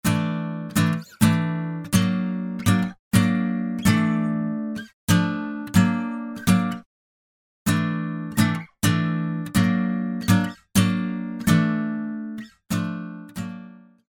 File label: Harmony Steel Fng Noise